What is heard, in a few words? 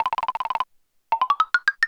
Dry Bones; New Super Mario Bros. Wii